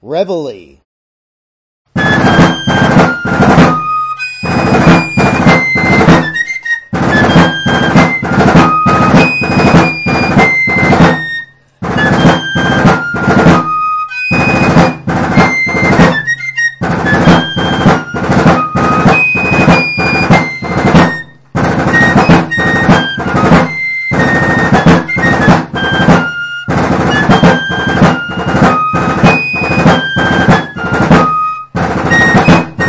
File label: Reveilly